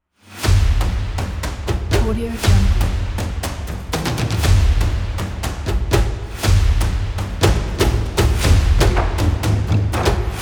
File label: sport drums